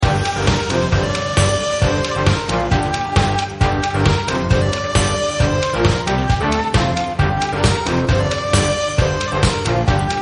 music

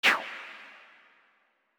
carselect woosh; Steven Universe - Beach City Drifters

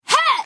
Player Hit; Blossom; The Powerpuff Girls - Mojo Mayhem; NextlevelGames

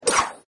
attack; Power Rangers vs Teenage Mutant Ninja Turtles - Ultimate Hero Clash 2; cat

